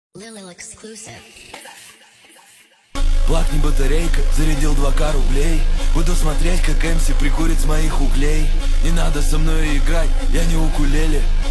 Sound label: ukulele; Укулеле